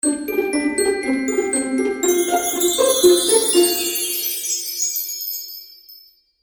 Magical Music Finale, Cartoon Music Sound Effects

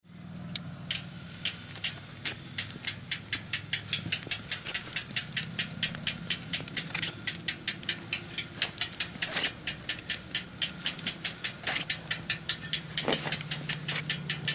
Bicycle Retro Cruiser Push & Walk; Bicycles; Vehicles; Big Room Sound

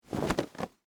Jeans Shake, Foley, Clothes; Dressing (Sound Effects:Human)

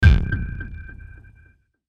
Hit Buzz Filter, Hit Buzz Filter Ping; Production Element Imaging Element Accent Transition; Blastwave

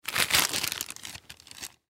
Bag Wax Paper; Pick Up; Rustling; Objects

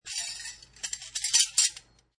Utensil, Collecting untensils; Collecting untensils, variation 5; Kitchen; Household

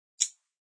Pocket knife snapped shut; Clicks (Sound Effects:Objects)

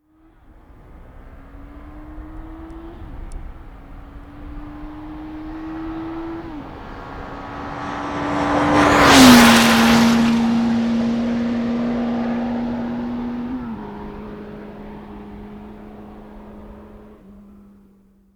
Motorbike Honda 1100 Pass very fast; Motorcycle; Tom Hutchings

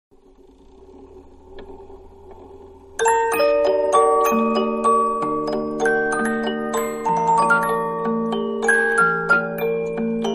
Music Box Tune; Toy Melodies (Sound Effects:Toys); Manhattan Production Music

